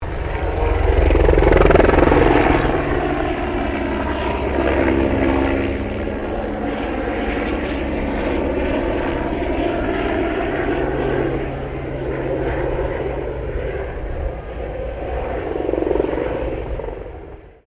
HELICOPTER 2007 AS-350BA SQUIRREL PASS; RAN Squirrel Helicopter; Vehicles; Aircraft; Helicopter Sound Effects; Helicopters (Sound Effects:Vehicles)

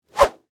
Whip Whoosh Cord; Foley; BlastWave

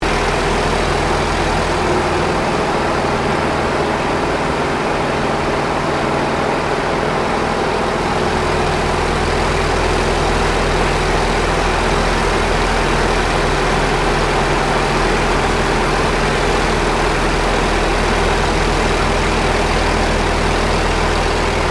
AIRCRAFT T-28D TROJAN FLYING INTERIOR; Airplane; Vehicles

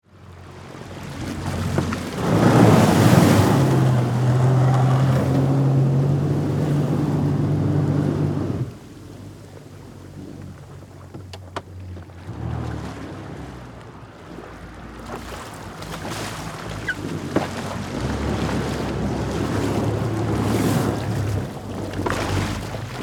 Jet Ski Activity, Watercraft, Ride, In, Circles; Transportation; Boat Sound Effects; BlastWave

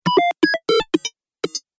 Digital Data Sequence, Sci Fi Music

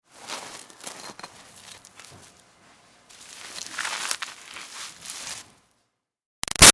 Foley Moves Dirt Gritty Smear; Debris; Materials; Big Room Sound

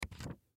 Pamphlet Open Cover Turn Page; Office; Blastwave

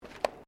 FOOTSTEPS THONGS (FLIPFLOPS) WOODEN DECK STEP; Footstep Foley; Human

